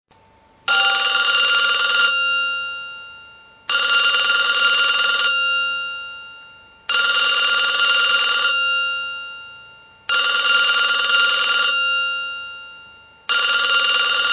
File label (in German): Altes Telefon